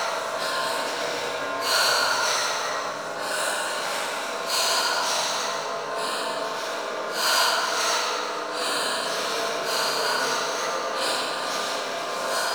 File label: Minigame Loop; Birth Minigame; Warframe; Jade Shadows